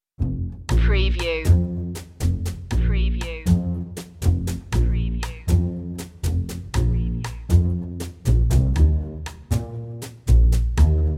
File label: bass and perc only